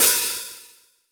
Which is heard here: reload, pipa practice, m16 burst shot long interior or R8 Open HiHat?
R8 Open HiHat